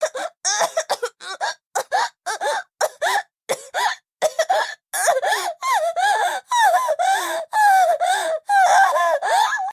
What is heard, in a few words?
coughing